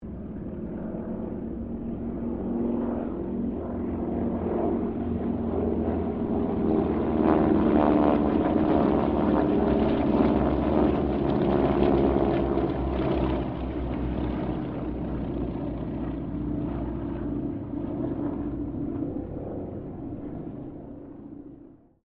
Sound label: Harvard squadron 4 pass, stereo